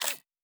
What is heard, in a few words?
servo small, sci-fi sounds, mechanical